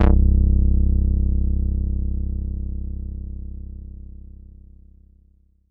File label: Rubber c1, Korg Poly-800